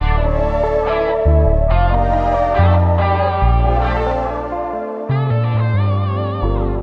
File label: guitar, Loops, Phonky